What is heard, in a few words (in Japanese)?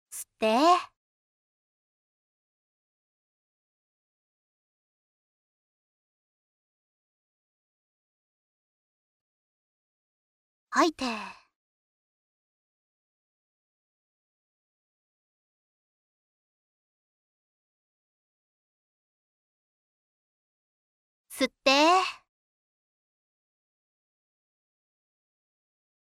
全身呼吸遅めループ用BGMなし, ASMR